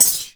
MISC, Skanky Perc

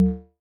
T Gong E, Tom, Yamaha DTXpress-II

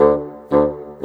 Bassoon, Orchestral, Rock Pop; Sonic Foundry (Sony Creative Software); Orchestral Series VOL-4; Rock and Pop; Rock-Pop 03

